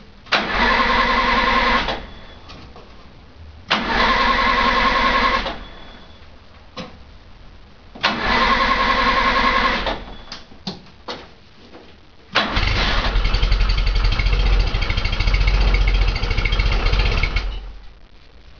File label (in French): demarreur